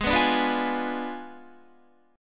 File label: Ab Diminished 7th Ab, Guitar, 4F, 4A, 5C, 5G, 5C, 6E; Abdim7 strummed; JGuitar